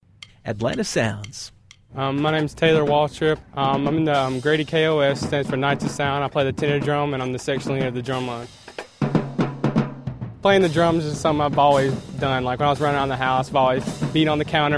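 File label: Drumline, atlanta sounds; Grady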